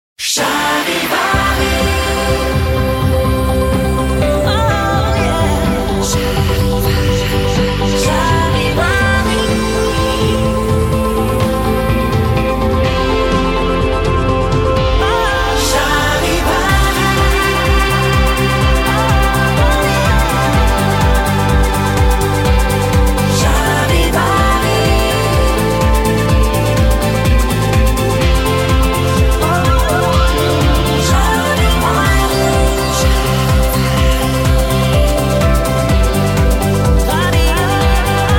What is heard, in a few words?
Main Theme Pop; Charivari